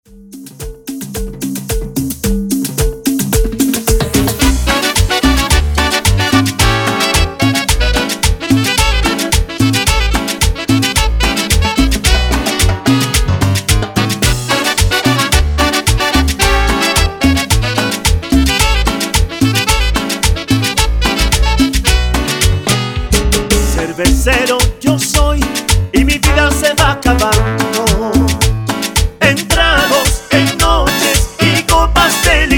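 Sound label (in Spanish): Intro Cumbia Ecuador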